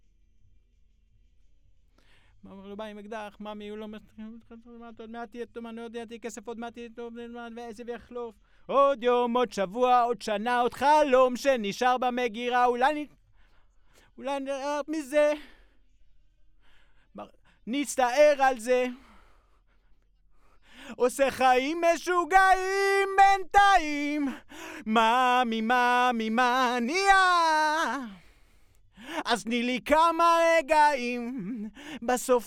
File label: VOX